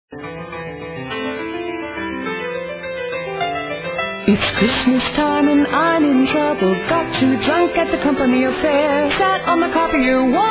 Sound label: Office Christmas Party Song; ChristmasComedy